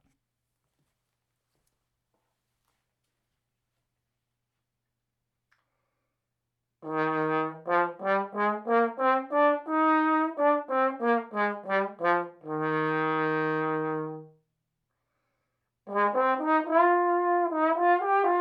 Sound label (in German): Posaune